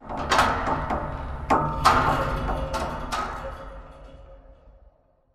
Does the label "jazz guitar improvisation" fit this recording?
No